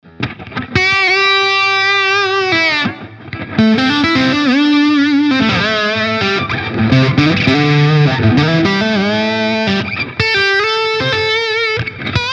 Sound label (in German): Humbucker verzerrt